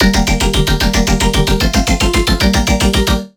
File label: Melodic Bass G 150, One-Shots Bass Hits; Spike; Ghosthack; Color Bass Essentials